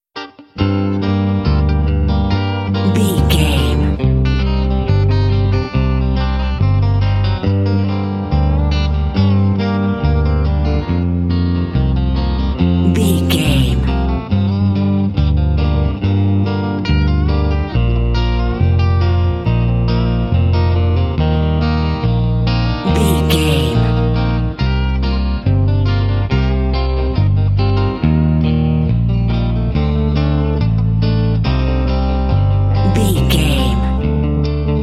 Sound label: tennesse rust gtr bass mix